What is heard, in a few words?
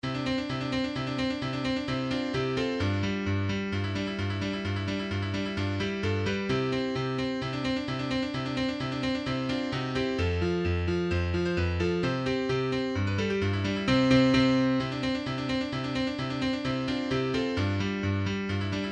Polka Vivace